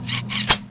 change empty, weapons

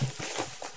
browning from ironsights

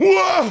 pain; yamcha

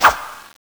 Swing; smallhits

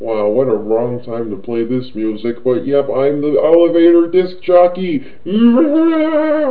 elevator

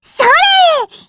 charge shoot; nepgear

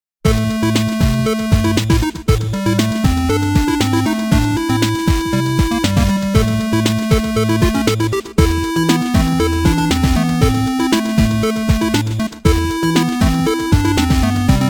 8bit

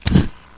molotov light

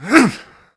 Swing

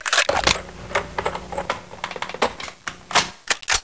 chaingun reload

